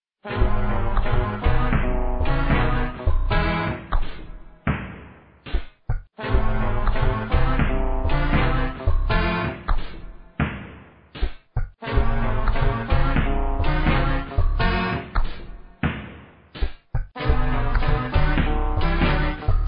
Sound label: course clear; vapesquared